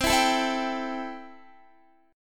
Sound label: C 6th C down Guitar; strummed